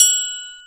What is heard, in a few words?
Triangle, Yamaha RY-30, Percussion; Drum Samples